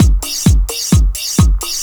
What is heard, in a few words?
knife sharp; Zero-G Deep House; 130bpm